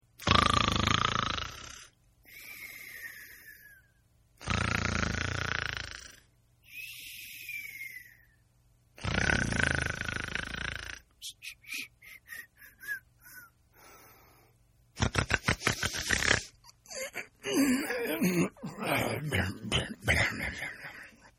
Snore Cartoon; Snoring; Human; Snore 5

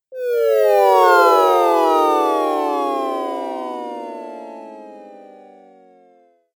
8 Bit Style Fall; Video Game Sound Effects

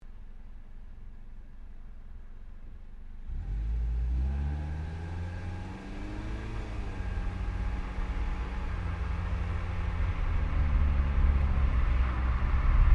Drive Sequence Exhaust, car; Hyundai Accent 2008; Hyundai hire car; Vehicles

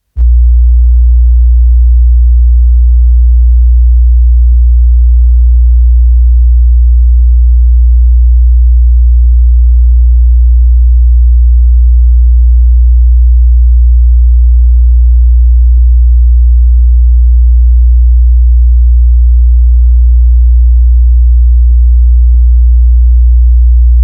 Sine Wave To, Sine Wave Tone, 60 Hz; Tones; Sound Design; BBC

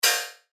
Chime High Tech; Chimes (Sound Effects:Multimedia)

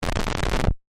Distortion Crackle; BlastWave

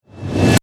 Reverse Whoosh; Production, Element, Riser, Reverse, Suction, Abrupt; Ascending; BlastWave

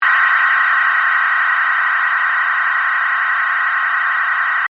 Ray Beam Burst, Scifi; Science, Fiction; Battle Sound Effects; BlastWave

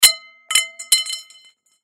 Impact Metal Pipe Fall Drop Cement; Sound Effects:Materials; Blastwave

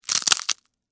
Texture, Crack, Crunch, Ice, Cream, Cone; Food; Household; Food Sound Effects

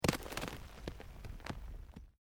ROCK TUMBLING

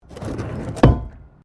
PLASTIC BARRICADE METAL PIN MOVE

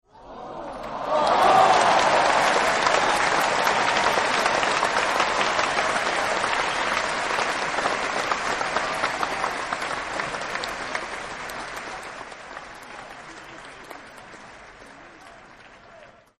Applause Big Cheer; Applause Ahh Yes; Human

